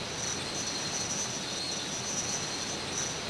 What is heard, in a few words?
trees; bracken